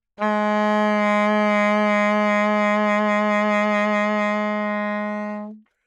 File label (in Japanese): Single Reed, 気鳴楽器; Saxophone